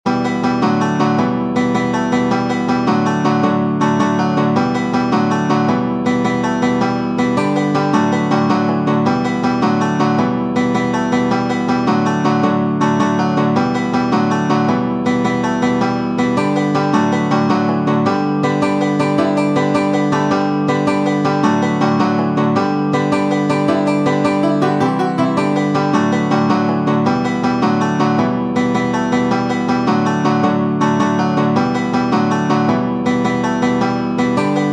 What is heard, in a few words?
Traditional jig, Irish banjo; The Leitrim Fancy